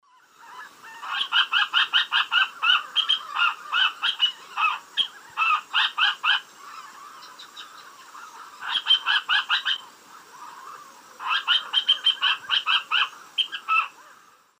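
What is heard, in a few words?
Laterallus melanophaius, calls playback; S. America; Eastern Lowlands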